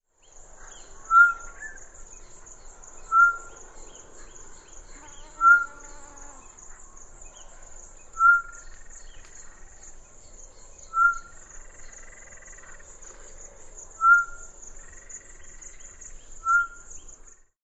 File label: Batis-mixta, پرنده سیه رخ جنگلی